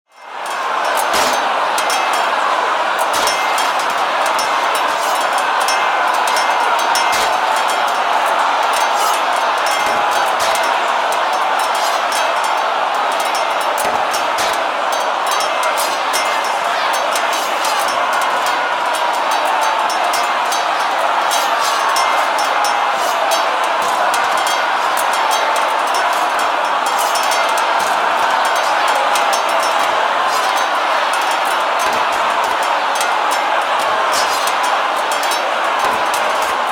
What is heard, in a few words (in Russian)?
srednevekovaja bitva na mechah ogromnaja tolpa s krikami